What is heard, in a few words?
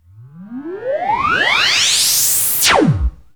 burst out; ILIO; Trance Fusion